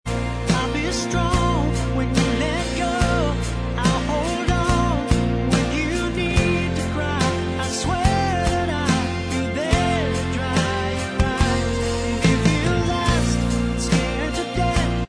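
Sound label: backing vocals, track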